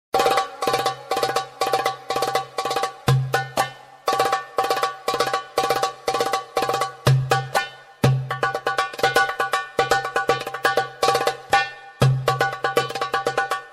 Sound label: arabic tabla, ringtone